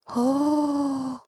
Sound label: post eat sigh